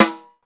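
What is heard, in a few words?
Snare, Roland; Real Snrs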